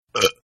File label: Burp; Burping; Human; Partners In Rhyme